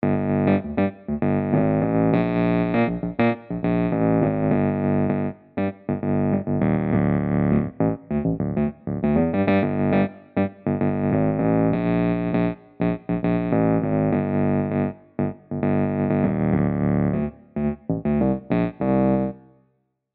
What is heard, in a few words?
Pianet Default bass line